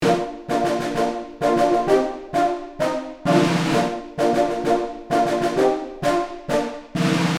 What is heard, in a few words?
Field drum and brass marching loop